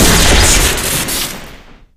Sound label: sniper crit, weapons; bazaar bargain; tf